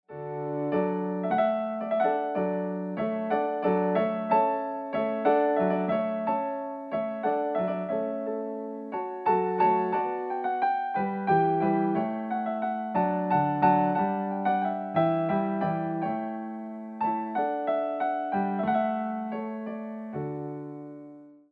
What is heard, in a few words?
Allegro Maestoso, piano